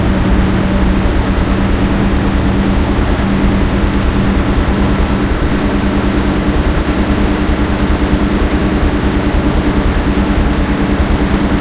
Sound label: stug40 engine int rpm 33, tanks; engines; War Thunder